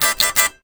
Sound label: Guitar Man Strum; gamerip; Dog's Life